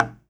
Percussion

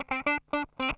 SQUEEZER, Funk Guitar; 90 s Sample CDs; Zero G

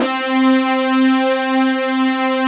fat, synth pad

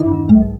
BOTTLE VS; Greytsounds VOL-1

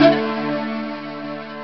d50 fifth intro short; Amiga Soundtracker Sample Packs

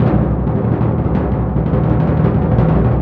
TMP C#, Synclavier Percussion

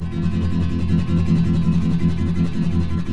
F# MJ, Guitars; Spectrasonics; Hans Zimmer Guitars VOL-2; FLAM TREM CH

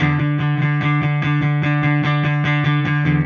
Silos Clean Strum Guitar, Indie Rock; Sonic Foundry (Sony Creative Software); Indie Rock Anthems; Session 11 - Silos

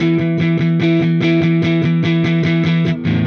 Silos Dirty Strum Guitar, Indie Rock

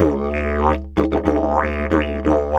Didge 93 BPM, Didgeridoo

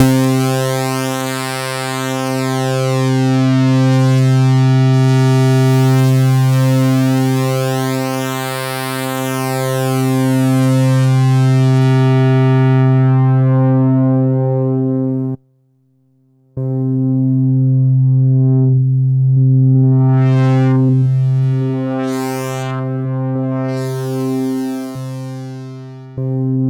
C3, SynthLine; 90 s Sample CDs; NiceBeats; SynthLine VOL-14

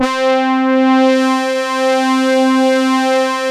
JV GR LEAD, JV-1080; Syntec; Wall of Sounds

